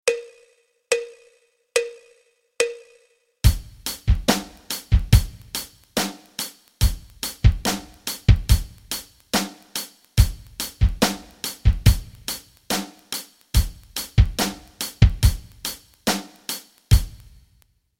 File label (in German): rock rhythmen